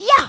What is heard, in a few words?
jump; mario; smsunshine